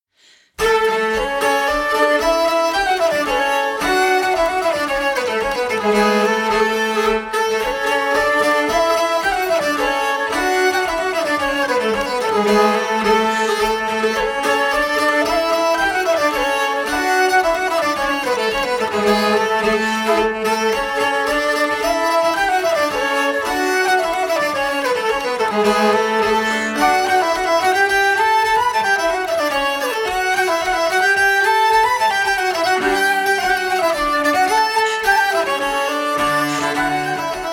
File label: polska; polska efter petter dufva